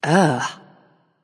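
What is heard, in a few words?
ugh, female; smite; voicepack; hera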